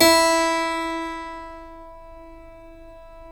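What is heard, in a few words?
E3 clavinet right, Keyboards Acoustic; USB Soundscan vol.17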